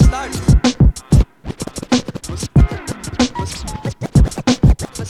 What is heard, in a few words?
jazz, breaks